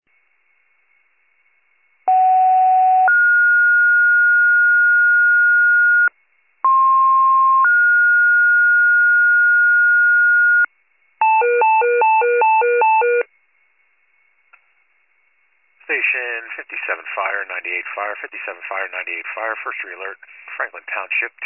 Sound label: FIRE pager alert